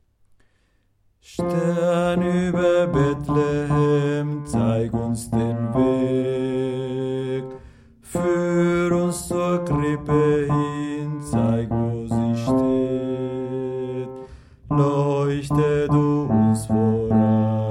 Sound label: Bass